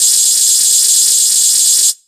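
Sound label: 127 drum 2 R, Fresh Disco House; USB Soundscan vol.20; AKAI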